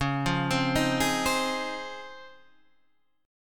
C sharp Major 9th, Guitar, Half Step Down

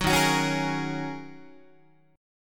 D sharp Minor 11th D sharp Guitar, chord; strummed; JGuitar

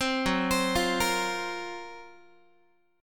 Gb Major 7th Flat 5th Guitar

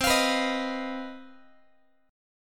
C Minor Major 7th Double Flat 5th chord, Guitar; CmM7bb5; strummed

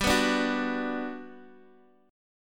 G Major 7th, Guitar, Open D; down

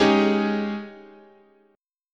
Ab Major 7th Suspended 4th, guitar chord

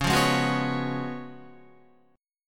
C sharp 11th C sharp, Guitar; strummed; C#11; JGuitar

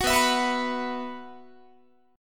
B Suspended 4th, Guitar; Bsus4; strummed

